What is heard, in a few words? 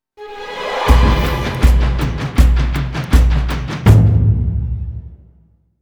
thrill jingle